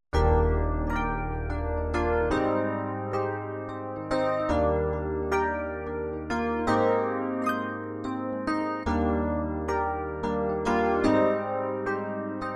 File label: yamaha tg500